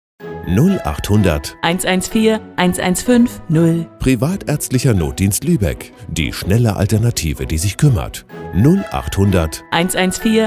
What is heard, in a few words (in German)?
Jingle; Privatärztlicher Notdienst Schleswig-Holstein